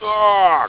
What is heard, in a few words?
Scream